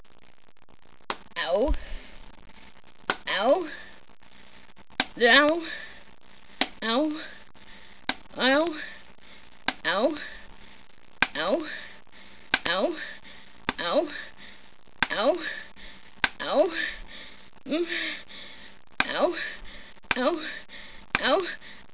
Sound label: ouch